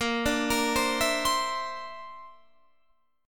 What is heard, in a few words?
D 7th Suspended 2nd Sharp 5th Bb